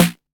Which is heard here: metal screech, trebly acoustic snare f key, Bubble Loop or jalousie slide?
trebly acoustic snare f key